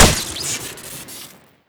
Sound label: tranq wood shoot crit; tf2classic